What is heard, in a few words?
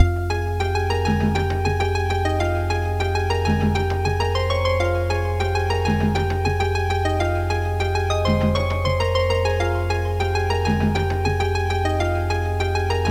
BGM Menu; hyperspace-roll